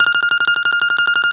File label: BALALAIKA, Prima Balalaika, Plucked Strings